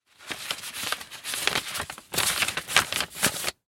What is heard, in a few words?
Letter Envelope Standard Fold And Put It